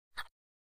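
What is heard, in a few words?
Poker Chip Movement; casino games; chip mvmt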